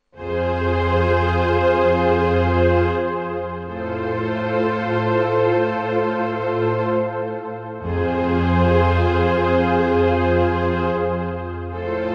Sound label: strings